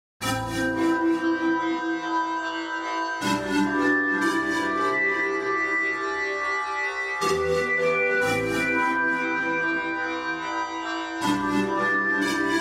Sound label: CHROMAPHONE 3 + OSTIRUS; ARGONK